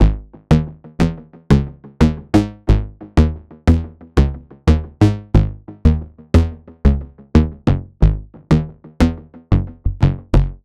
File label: songstarter 90 Abm, Pop; Slate; SD PASADENA Pop Sample Pack